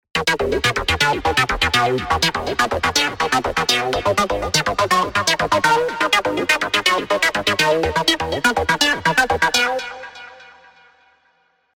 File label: Punk Coding; Spire